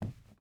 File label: footstep hide wood walk; Rust